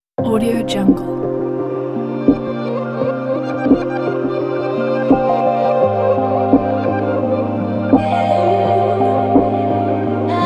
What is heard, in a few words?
chilly hip hop